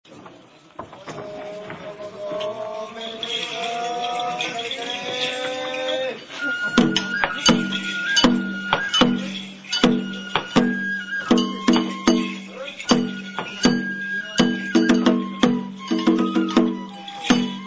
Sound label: banjo